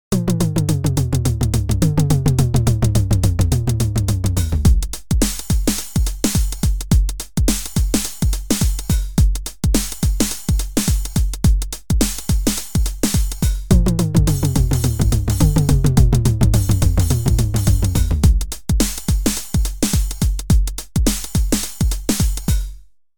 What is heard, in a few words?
slow, 909